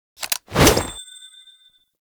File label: Grenade Throw; Warframe; The New War; Kahl; Abilities